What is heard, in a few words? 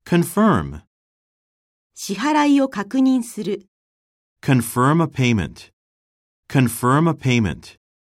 confirm